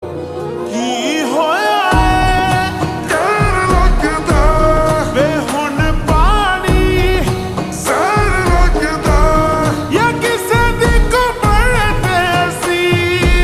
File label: Ringtone slowed and reverb